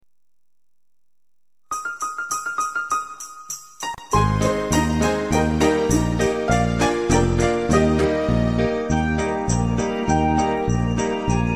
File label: no vocals